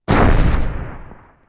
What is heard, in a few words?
bazooka fire new; WAR